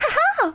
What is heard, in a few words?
haha; birdo; SM64 CO-OP